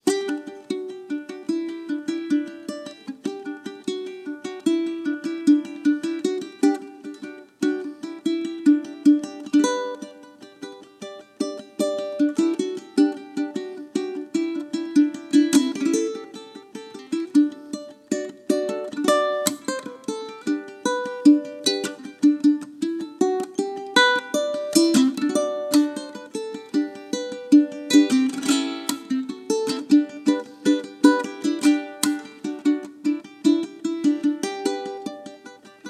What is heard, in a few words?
Solo In G